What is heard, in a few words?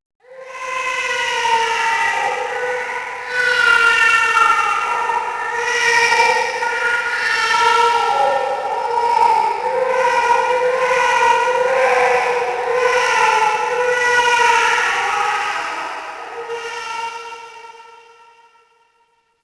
baby crying; Max Payne; nightmare